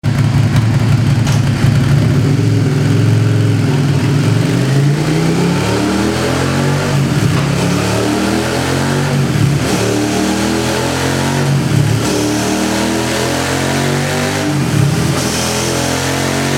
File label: SUZUKI SV650 GP; 04-15